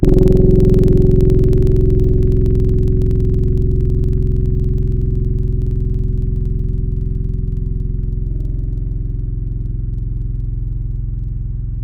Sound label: Tele Vox Clean Mid; Synthetic's Serum Collection; Surge Sounds - The Sky - Serum Wavetables